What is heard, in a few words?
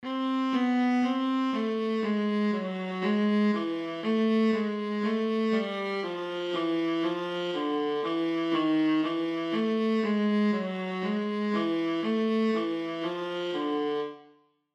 Alto Clef Example Viola